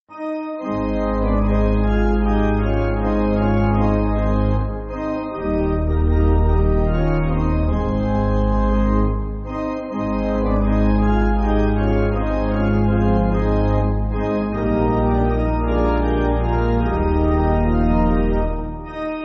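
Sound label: Pipe Organ, church backing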